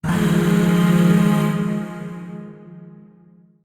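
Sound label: Shawzin Minor Chord; Warframe; Zariman; Void Shawzin; Chords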